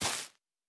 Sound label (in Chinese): 海绵踩踏声